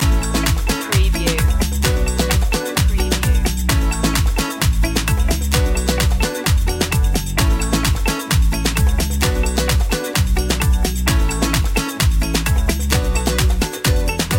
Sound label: tropical party loop, Carnival / Party; fun